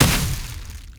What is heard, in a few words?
Updated Shot, weapons; Warframe; Moa Pet; Cryotra